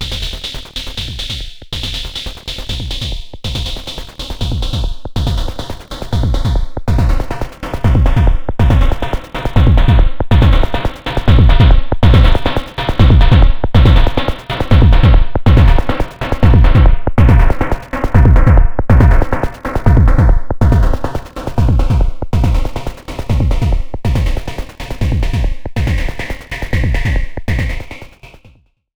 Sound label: Loops And Drones